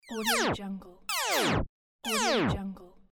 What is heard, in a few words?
futuristic shot; mrsoundfx